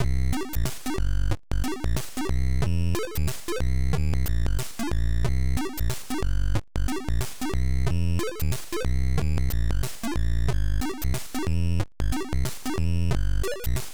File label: Hawaii Blues